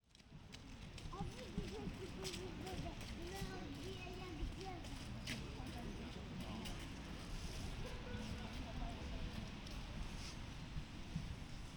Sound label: binaural